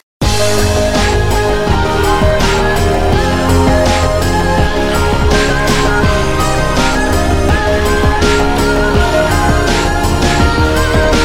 Seoul Subway Jingle

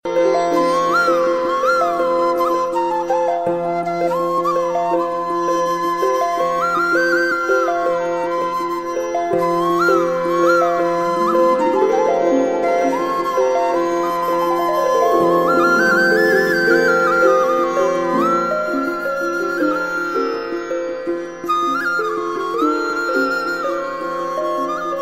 Flute Sad

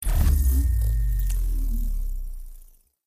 Open

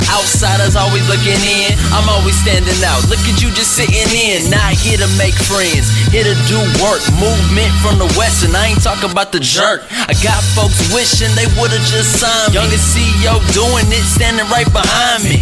Good Music

